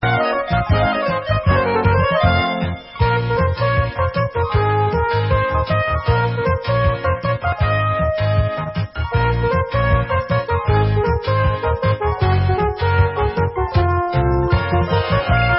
Hora Instrumental

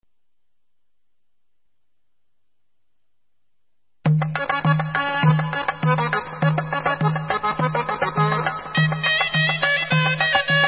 Instrumental

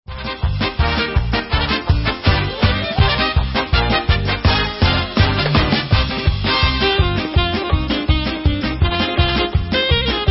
Hora Instrumental

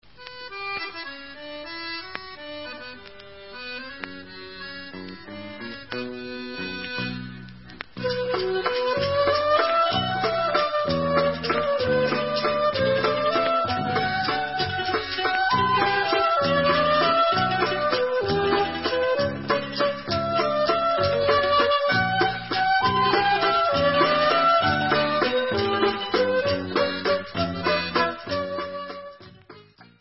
Vals Instrumental

